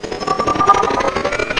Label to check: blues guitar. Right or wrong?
Wrong